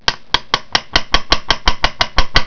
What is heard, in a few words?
knock